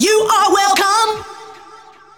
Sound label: vocals; SoundFactory; SMPMANIA